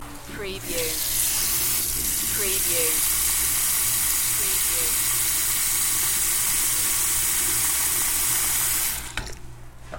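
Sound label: Bathroom Faucet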